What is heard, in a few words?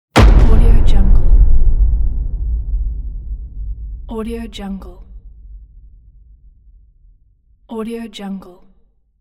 long explosion